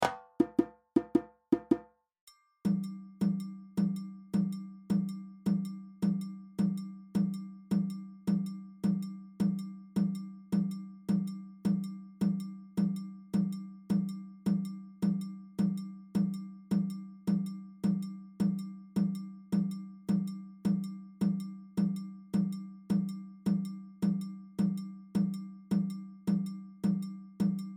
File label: Kenkeni